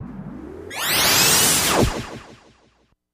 Starship Warp Spd Pa

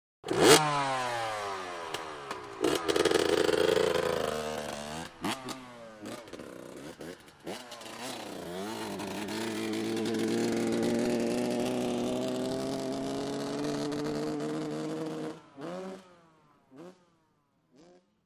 Motorcycle 125 Rev, motocross

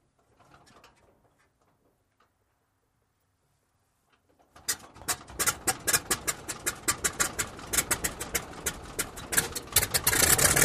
Prop Plane Start Idle, int

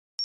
Heart Rate Beep High, beeps

